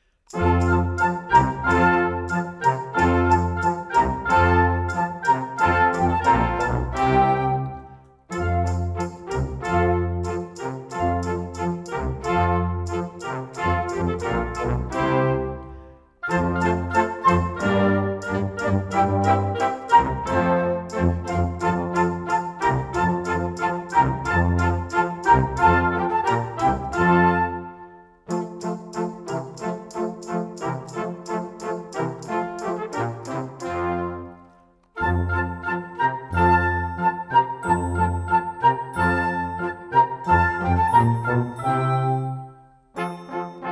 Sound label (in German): Vivaldi ohne click